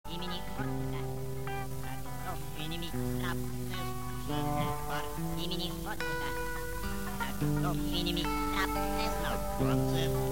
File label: instrumental; punk